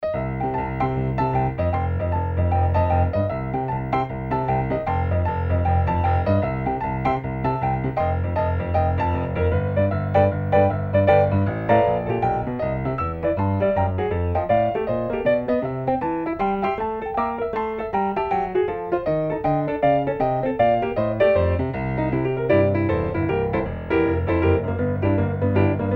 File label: Boogie Woogie Woo